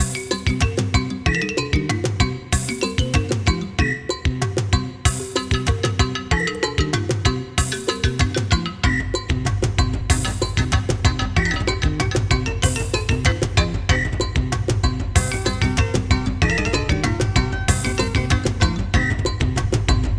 Safari Music Loop

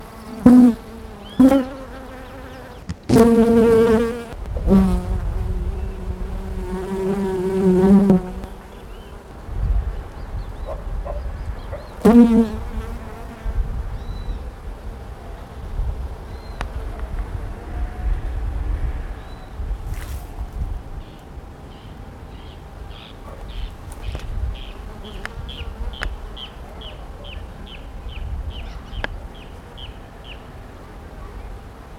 Bees

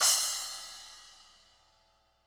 Splash High Vol

